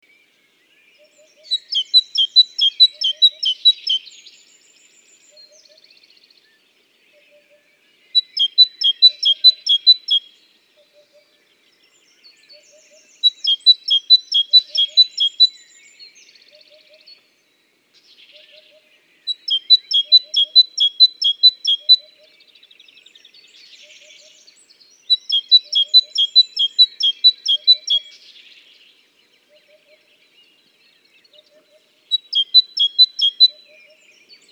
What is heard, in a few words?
Parus major; SETE